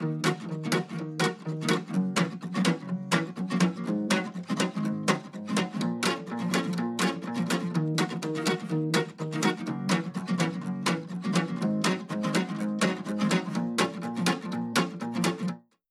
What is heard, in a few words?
guitar